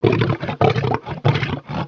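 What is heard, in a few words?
Pets Triceratops Ecstatic; creatures; Lego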